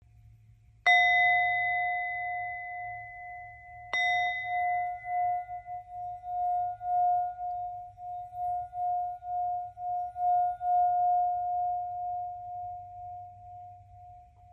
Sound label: Small Gold Dimple, bowl; 4.0 inch gold dimple bowl